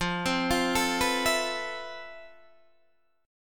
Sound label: F Major Sharp 11th, Guitar, Standard; FM#11 arpeggiated